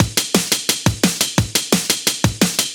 DNB BREAK

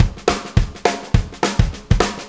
Ballroom Beat, Drum Loops

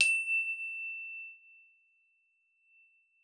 E5, GLOCKENSP S; Xsample VOL-4

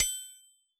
metronome bell, Yamaha DJX Rock Kit

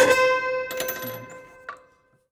Prepared Piano; Battery 3 Sample Kits

